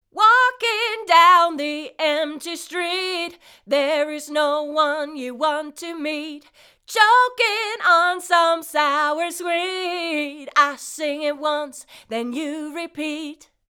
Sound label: TritonAudio D2O Mono Vocal Fet HI